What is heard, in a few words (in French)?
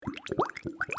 Fiole; puzzle-quest